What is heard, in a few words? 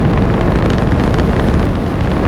idle loop; Cyber Ezreal